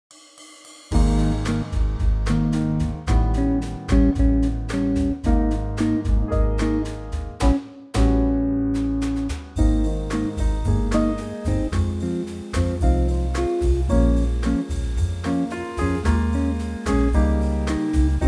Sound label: Ab to Ab bossa